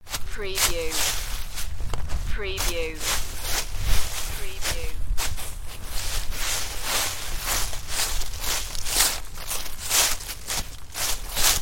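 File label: Footsteps Leaves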